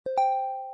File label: notify